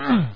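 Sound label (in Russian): ворчание мужской недовольный выдох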